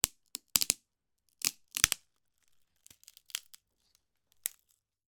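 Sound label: impact wood bamboo snap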